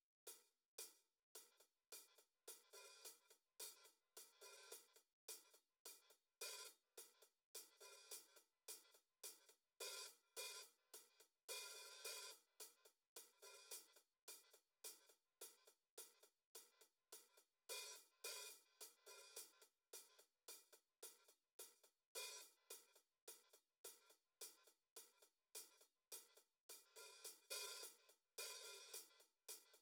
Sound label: hihat; AugustRain